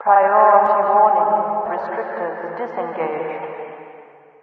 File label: restrictors disengaged, ambient citadel; video games; source engine; episodic